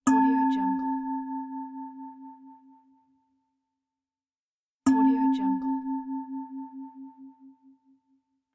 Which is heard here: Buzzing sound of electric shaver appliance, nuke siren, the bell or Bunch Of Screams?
the bell